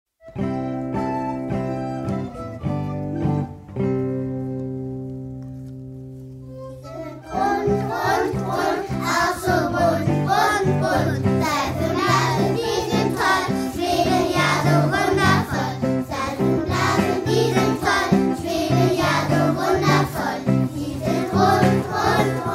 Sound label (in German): Seifenblasen Lied